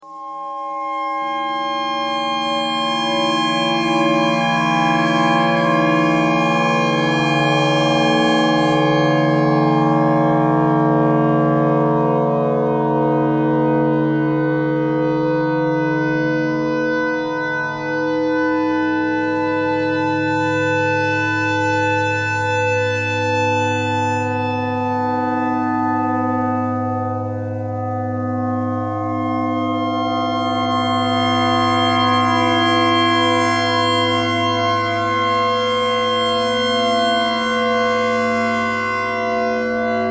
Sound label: clarinet clarinet notes e thread noise